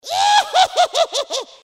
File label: Witch laughs evilly